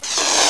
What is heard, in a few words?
cat hiss